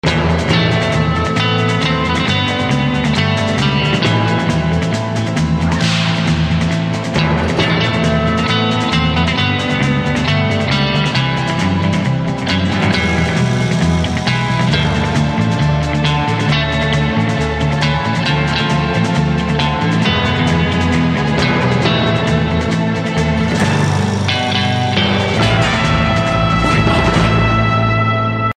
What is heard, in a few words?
Western